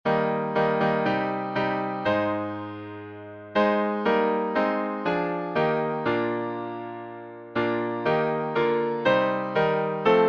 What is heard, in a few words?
Hymns